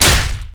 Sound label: basic attack human; LoL; shyvana